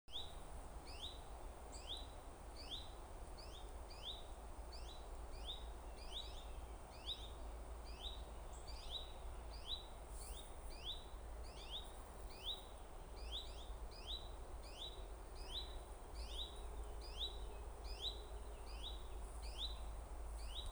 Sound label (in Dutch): Zanger Tjiftjaf Phylloscopus collybita; dieren